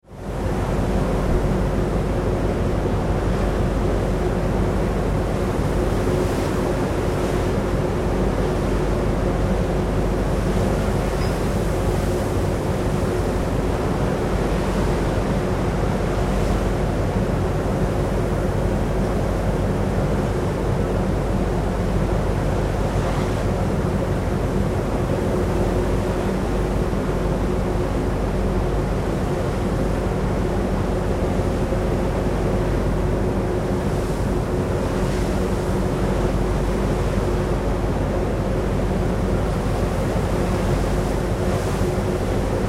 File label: Ship Oil Pipeline Service Vessel Exterior Atmosphere